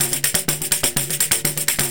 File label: Percussion Loops, Brazilian; Houseworx